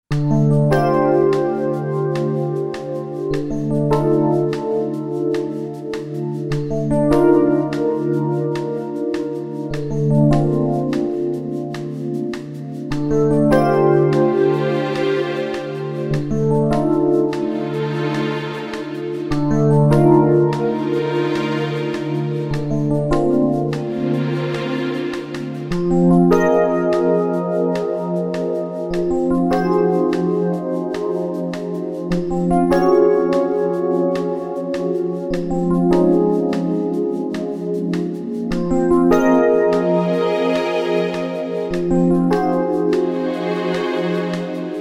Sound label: Suspense